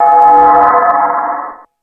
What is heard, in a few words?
Multi FX, Drum Machines, E-MU Pro-Cussion